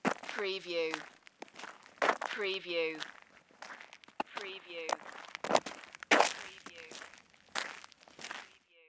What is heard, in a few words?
Footstep Gravel Walk